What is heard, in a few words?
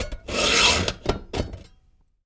STEEL, SAWING; 1000fx